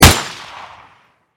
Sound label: fire silenced